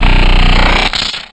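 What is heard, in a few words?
Laser Fire Interior Empty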